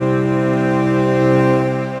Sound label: Solemn Wash, distressed strings; Instruments; Ableton; Mood Reel